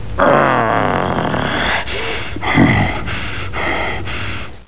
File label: growl